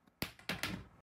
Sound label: plastic pipe